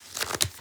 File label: shuffle deck